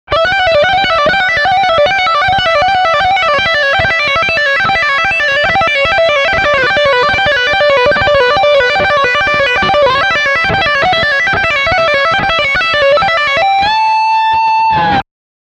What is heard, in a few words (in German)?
Lick Steghumbucker